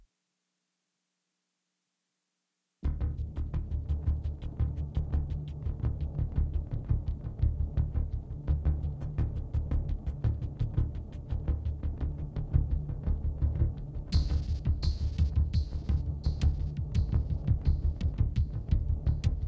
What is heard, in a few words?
Pulse bed